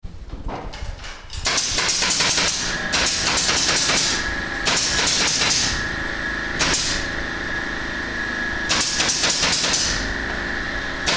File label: construction site